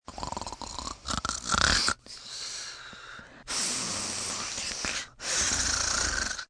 Snore Breathy, Snoring, female, deep sleep (human voice)